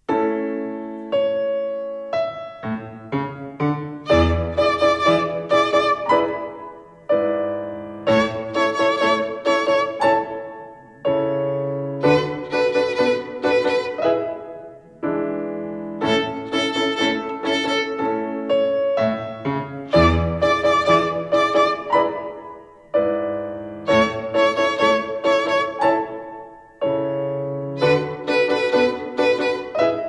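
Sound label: Rhythm with Three Fingers Third Twinkle Rhythm, violin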